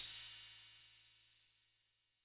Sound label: long tail reverb crash cymbal single hit g sharp key